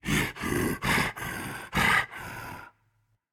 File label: breath, voicepack; smite; jorm